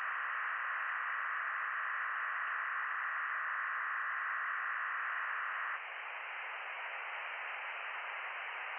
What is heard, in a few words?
from radio, 7177KHz